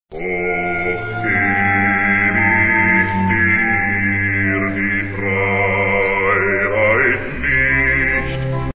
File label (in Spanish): bajo